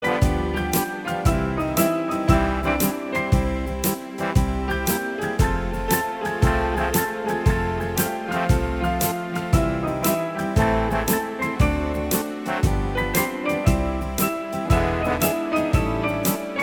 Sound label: blues